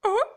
cute, creature

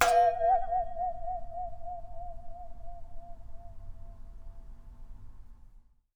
Wobble, Japanese Pull Saw

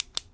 Click Button; bb - Smol Mechanisms

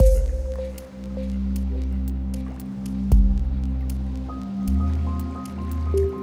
Wistful, Pads; BB 2HTC Samples Vol 4

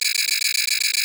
alarm, loops; cc0 sfx loops

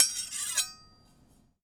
Big Knife - Scrape, kitchen knives; Micro Pack

